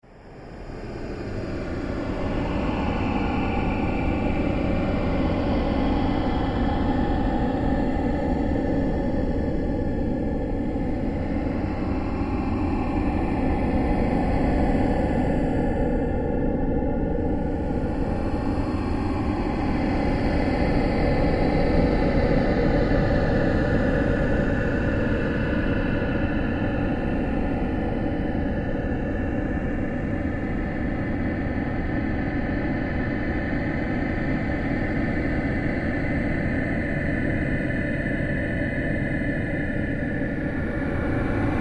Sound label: Created Drone Long; sound building blocks created through manipulation of raw material; Drones